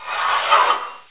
drag, ogre; svencoop; quake1

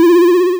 tron spawn; w00tguy; arcade

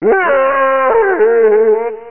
attack; svencoop; uboa; ichyhakase

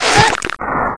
baba strike; zelda

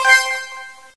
puzzle good; ragemap2019; giegue

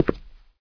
step, player; mistake coop

